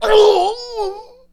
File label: Male Die; Mars Sortie